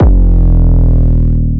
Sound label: 808 D#; Cymatics